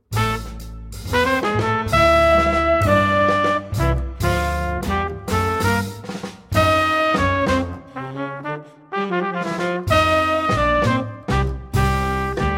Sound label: minus piano